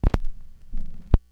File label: Needle Pop, Vinyl Record Samples